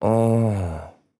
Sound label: Moan, MVoice; animaddons-voicepatch